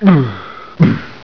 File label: DYING; PEOPLE